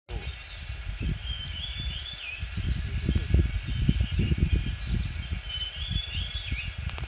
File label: Dusky fulvetta